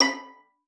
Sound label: B5, VIOLIN SPICC; Xsample VOL-5